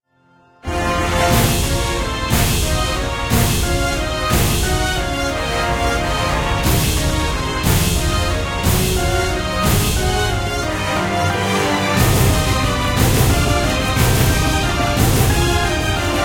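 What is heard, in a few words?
background music crossfade; Invaxion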